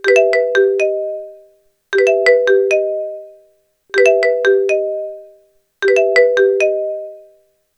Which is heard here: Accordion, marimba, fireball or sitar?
marimba